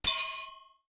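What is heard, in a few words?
finger cymbal; East West; Global Sounds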